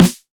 low acoustic snare f key, snare sound tuned to the F note; snare drum samples